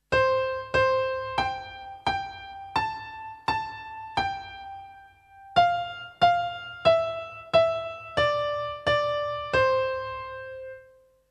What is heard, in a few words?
piano